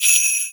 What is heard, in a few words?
Sleigh Bells Single; Warframe